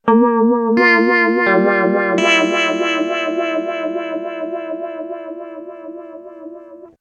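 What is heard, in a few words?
clean guitar pigtronix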